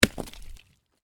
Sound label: Ice chunk heavy, Ice: large chunk of ice lands on hard surface; Snow Sound Effects; Stuart Duffield